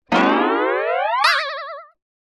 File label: Slide Guitar Comic Stretching And Final Thin Hit; Hollywood Edge; sound designer's toolkit v2